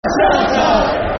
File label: Sit Down Shut Up [Football Chant (Soccer Songs)]; Colchester United Cufc